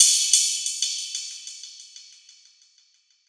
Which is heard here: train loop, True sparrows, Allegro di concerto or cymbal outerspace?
cymbal outerspace